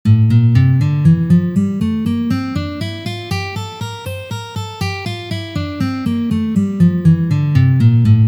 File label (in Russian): Gamma Re minor naturalnyj vid 2 pozitsiya